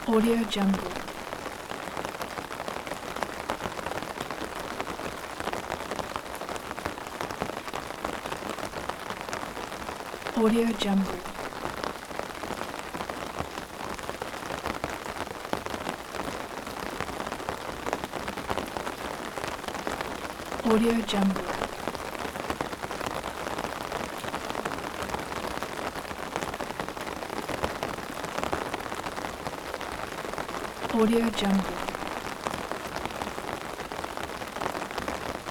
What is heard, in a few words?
rain on umbrella soft